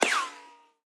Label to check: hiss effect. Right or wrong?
Wrong